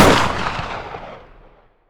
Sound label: shotgun ext shot